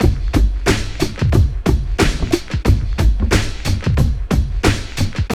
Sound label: TRIP 90, trip loop